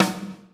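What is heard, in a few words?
LUDWIG SNARE, ACOUSTIC KIT; Greytsounds VOL-1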